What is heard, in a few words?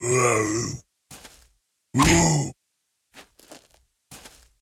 Mummy Attack